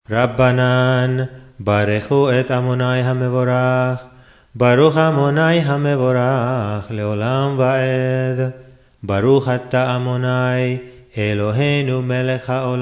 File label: blessing before parasha baghdadi